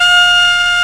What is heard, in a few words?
HEAGUM F 3, KOREAN